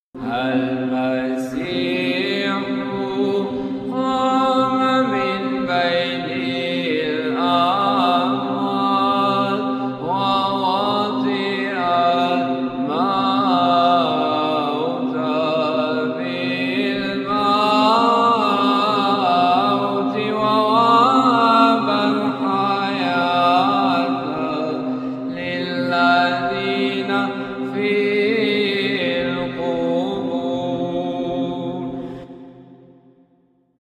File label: Christos Anesti Arab; Stoudion